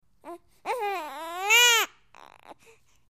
Baby Whines Cries Bre

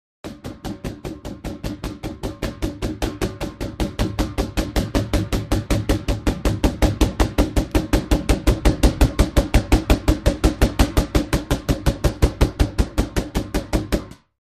Punch Balls Multipl, punch ball

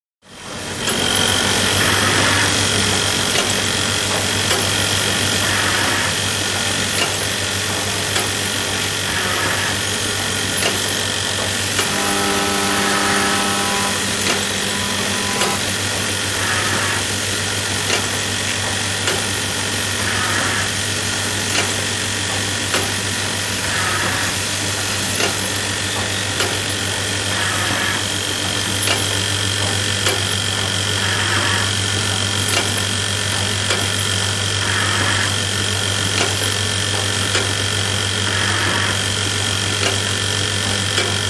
Machine Shop, factory; Braxton

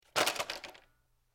RC Car Toy Car Drops On

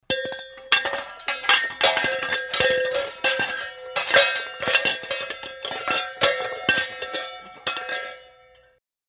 Clanging Pots Pans

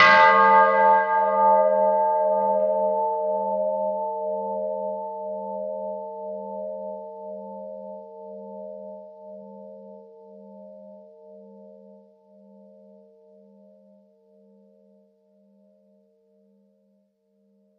Church Bell Ringing; bell towers

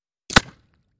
Bullet Impacts Water

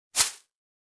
Foliage Impact Soft; hits & impacts

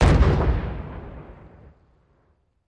1x2 Pounds Pipe Bomb

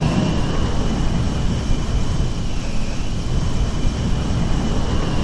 Space Station Int

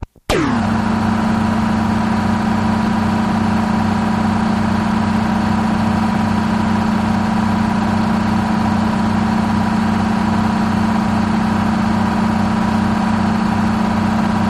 Engine Diesel Twin

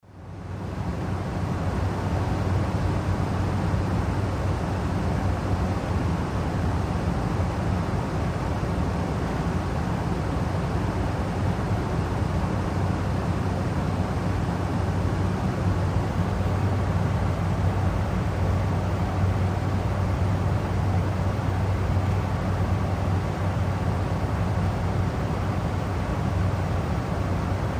Boeing 747 jumbo jet, interior, constant flight

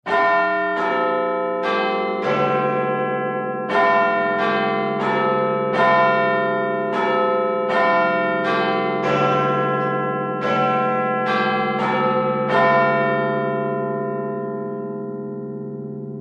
Big Ben Chimes Strike, exterior; bell towers

